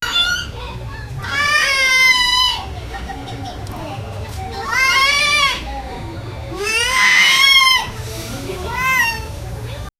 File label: monkey in the zoo